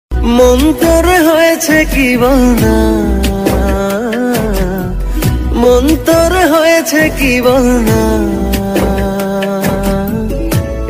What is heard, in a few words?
Bengali Ringtone